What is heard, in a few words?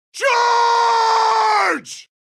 charge, Voices Emotes; eng